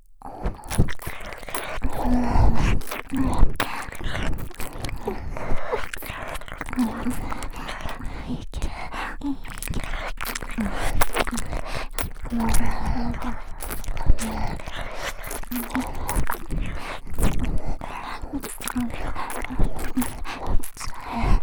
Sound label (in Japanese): お耳舐め イケイケ連呼